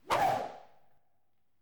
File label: Zap Fish